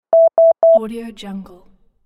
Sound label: caution signal